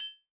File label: Roland Juno D Perc Menu Kit